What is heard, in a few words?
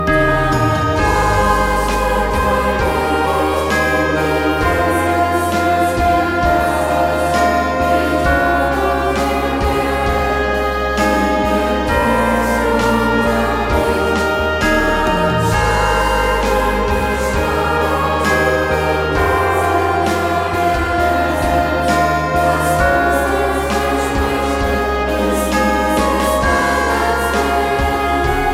karaoke